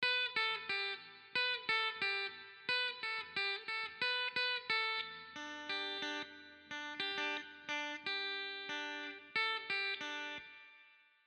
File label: strato wet; Chiqui Audio; Free Wet Melody 3 90 Bpm G Maj; Fender Stratocaster